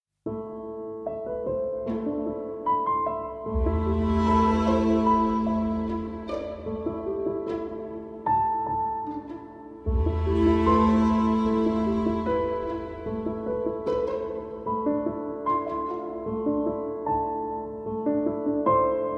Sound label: piano music; Hachiko